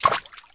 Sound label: player slosh; firearms